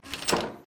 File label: door close; doors